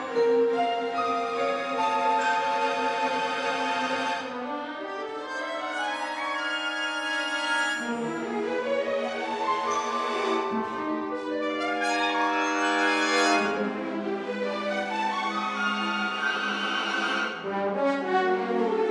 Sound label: Orchestra Concerto Conclusion